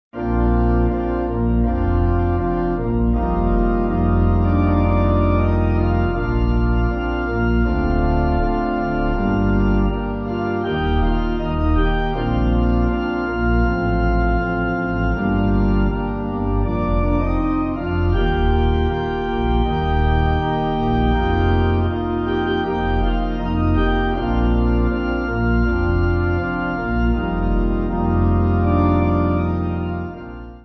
Pipe

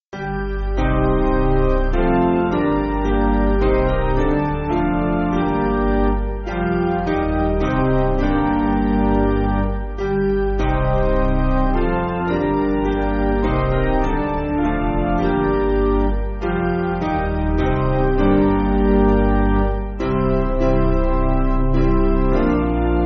When Grief Is Raw Piano

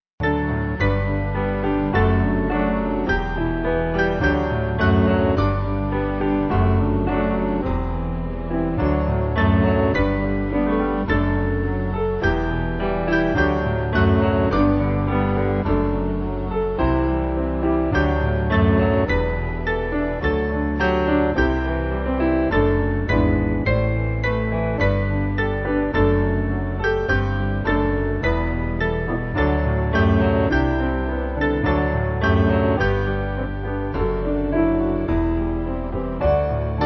Piano

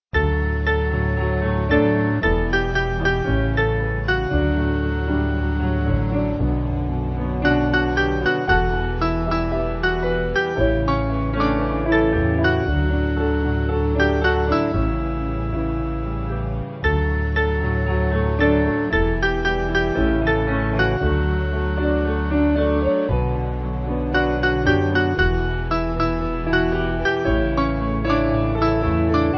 Worthy O Worthy Piano

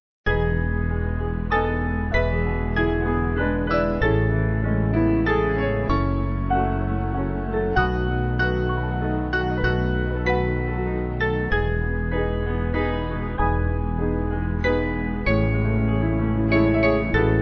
Piano

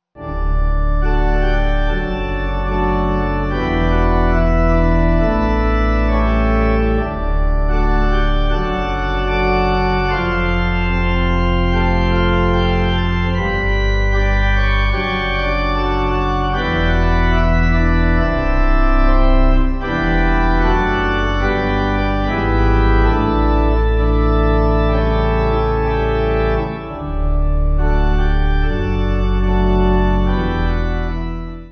Pipe

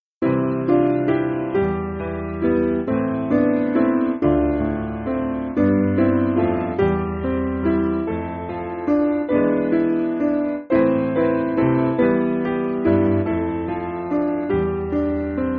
Piano

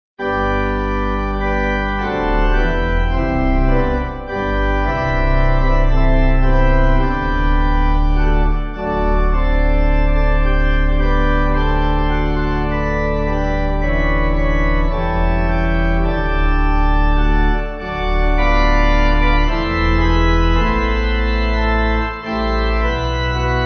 Pipe

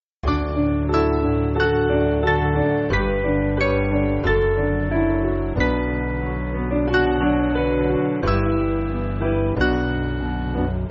Piano

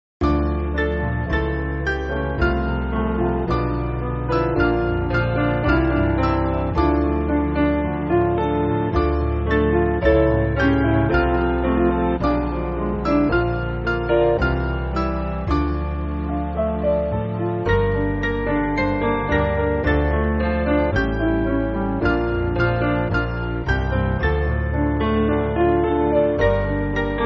Piano

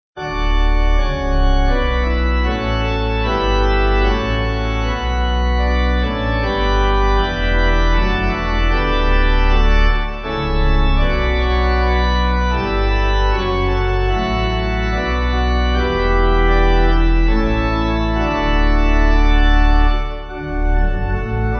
Pipe

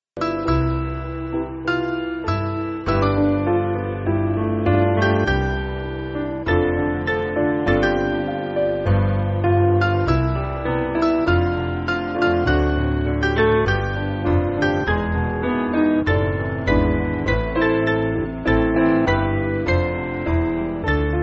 Piano

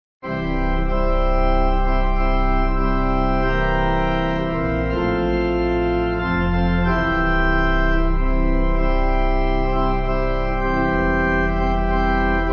Pipe

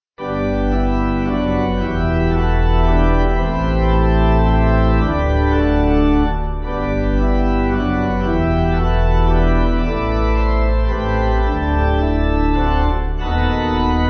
Pipe

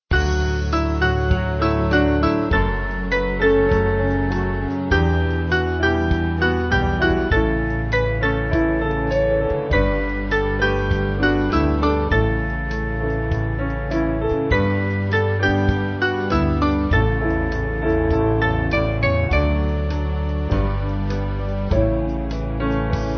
Band

